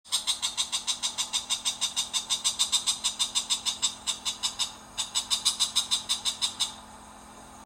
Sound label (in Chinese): 紅尾伯勞